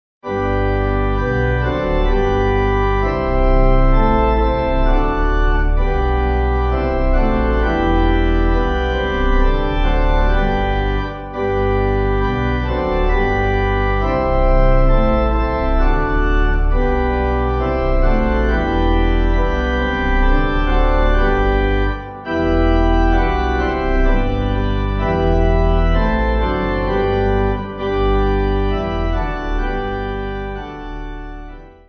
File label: Pipe